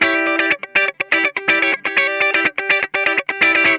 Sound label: E PEDAL, Funk Guitar; 90 s Sample CDs; Zero G